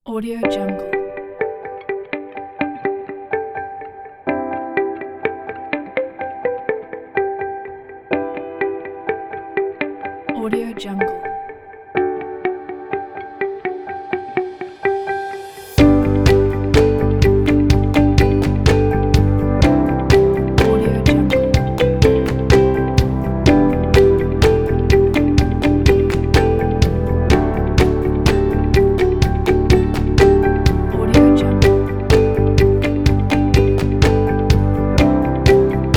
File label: soft indie